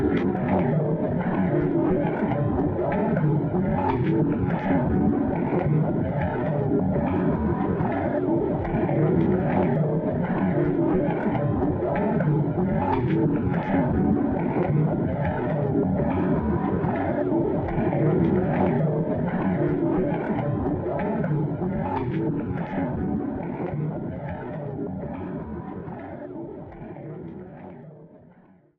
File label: Player Body Sliding Faster Loop